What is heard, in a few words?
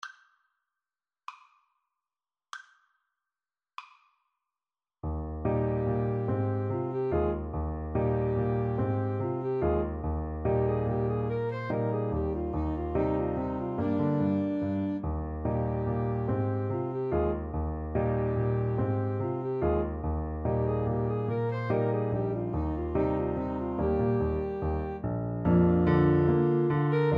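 Alto Saxophone Duet, karaoke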